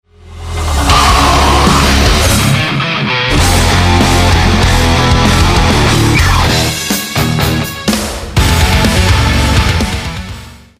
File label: FIVE RIFFS